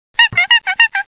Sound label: HONK